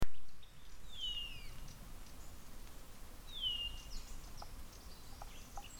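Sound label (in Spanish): tanga bonito, Clorophonia cyanea, Blue-naped Chlorophonia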